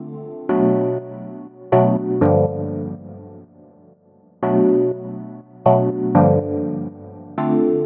House Stab Loop Dm 122, Deep House Chords; Loops; GhostHack; AC2023 - Day 17 - Dub Techno & Deep House Chords

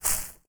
Footstep Grass Light; Footsteps

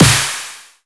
Snare, Dubstep One-Shots Drums; Ghosthack; AC2024 - Day 13 - Dubstep Sounds 2025; Grayness

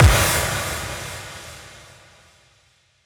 Impact; SFX; Dubstep; Ghosthack; Dubstep Sound Arsenal

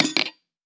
Percussion, Hip Hop, Drum One Shots

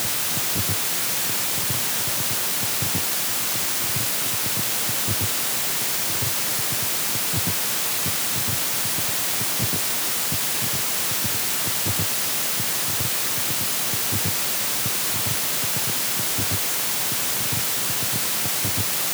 Tape Hiss; FX; Cymatics; Eternity Sample Pack; Noise

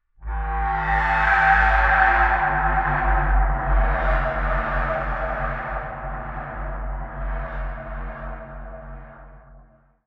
Pad; Dubstep

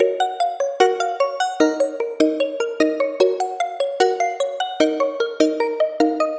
Melody D# 150 Toy, Melodic Loops, Color Bass; Ghosthack - Color Bass Essentials

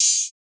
Open Hihat; Drum One Shots; Cymbals

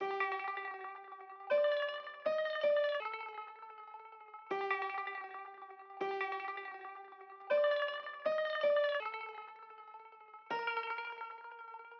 Nostalgic Melody Loop 160 BPM G Min Lead; Loop Stems; Cymatics; 2020 Melody Collection

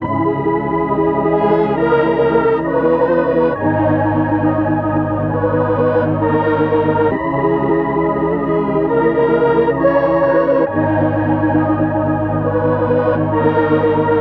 135 BPM G# Min, Analog; Levitate; P6; Cymatics

